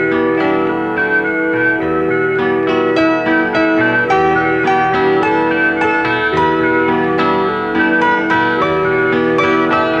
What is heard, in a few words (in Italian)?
Un Pianoforte Folk